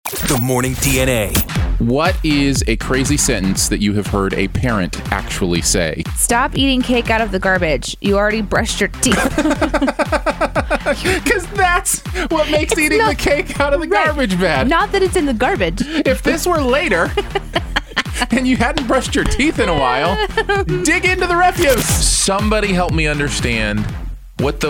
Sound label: UPBEAT